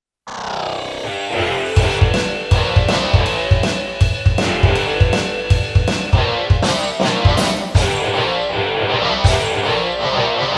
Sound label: rock